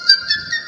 Infinix Zero X Notification Ringtone

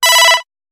Game Notification; Samsung S21

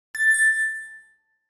Htc Ringtone Sms

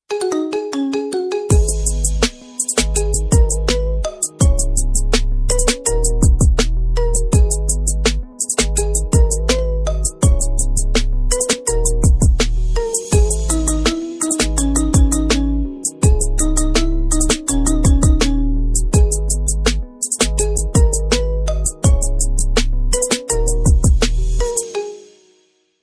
Marimba Remix iPhone 13 Ringtone

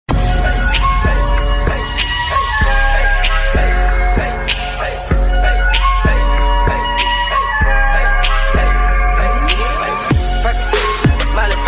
Trending Ringtone English

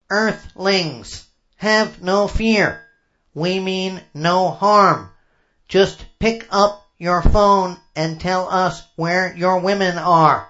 alien ringtone

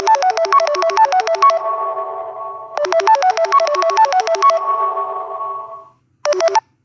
Samsung Quantum Bell Original Ringtone

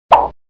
Ringtone for Whatsapp Message

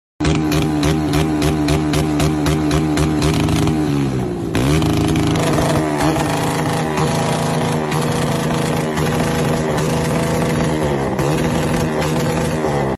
Super Bike Ringtone

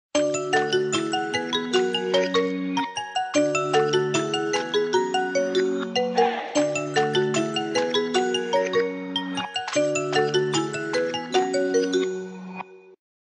Samsung Galaxy S10 Ringtone

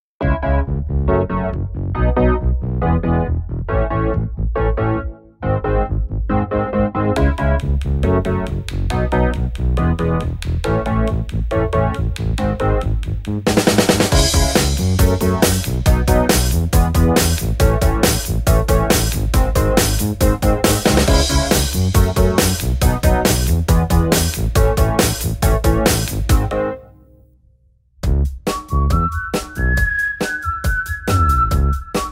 Instrumental Ringtone

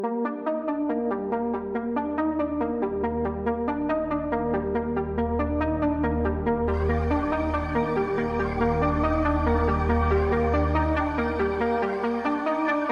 Starter Music Electronic Ringtone

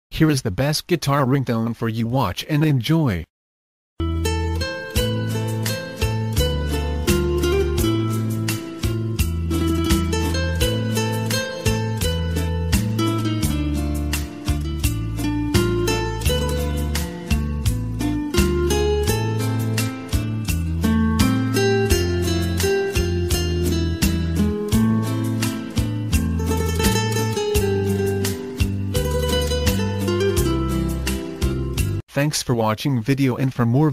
Melody Guitar Ringtone

